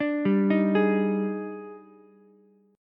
Eb Major 11th, Ukulele, C6; EbM11; arpeggiated; JGuitar

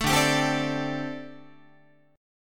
D 7th Suspended 4th, down, Guitar

